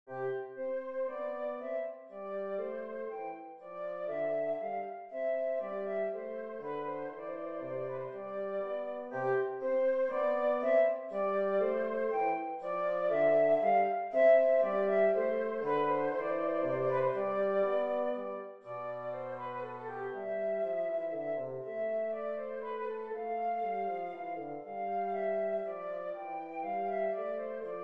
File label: passepied